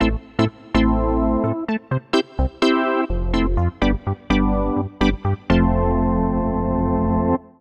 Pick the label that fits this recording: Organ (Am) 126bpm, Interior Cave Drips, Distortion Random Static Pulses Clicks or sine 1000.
Organ (Am) 126bpm